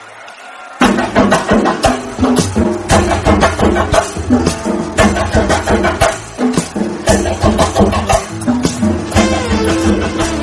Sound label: movie bgm kerala drums violin